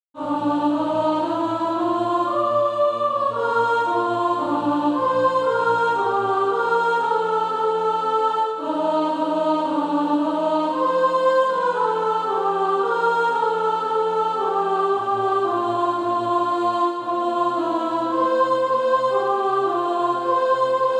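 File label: soprano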